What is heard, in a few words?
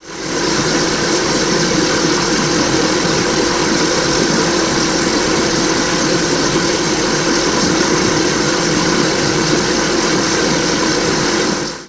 blender synth